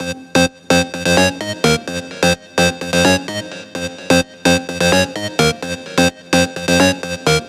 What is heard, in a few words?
Serum Dance Lead